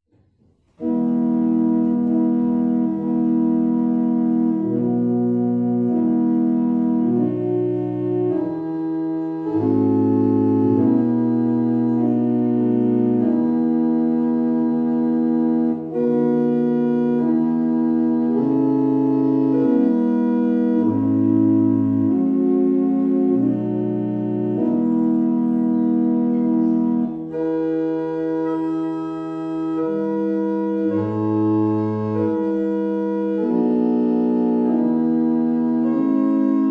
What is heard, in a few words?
Irish, barrel organs; Raithby